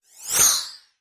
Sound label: Sharp Cut Sweep